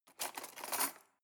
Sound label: cutlery cake forks drawer grab